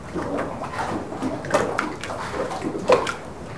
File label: lap; Splashdown; XBOX; Soultree; Barrier